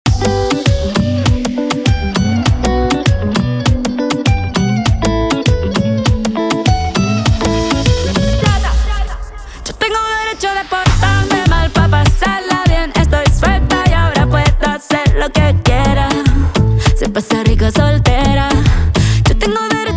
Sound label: Intro Outro 100 BPM